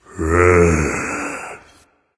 content, voice; Smite; ymir skin12

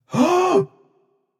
nv breath; smite; xbalanque

